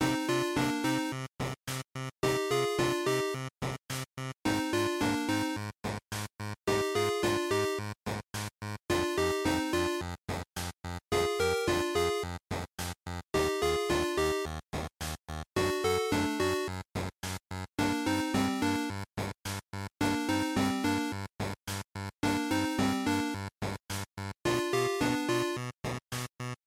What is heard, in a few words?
Dungeon Loop; GB RPG; bgm